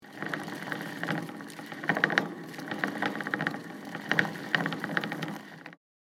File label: Hamster spinning on a wheel close perspective